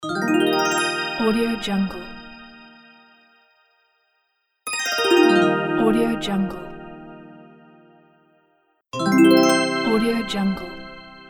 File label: slow magic arp; easytunes